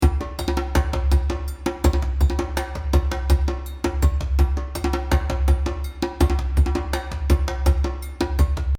perc

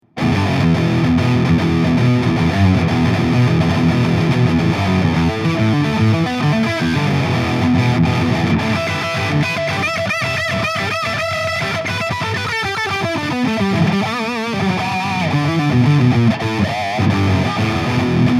ir 200 metal

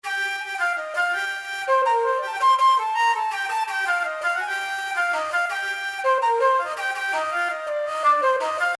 flute

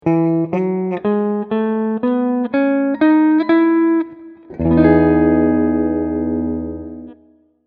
E major

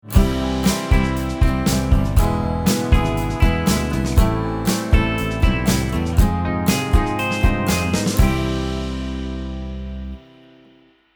subdominant minor

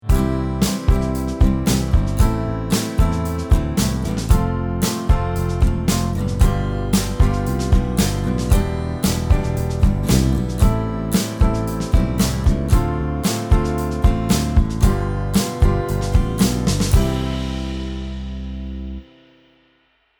diatonic prog

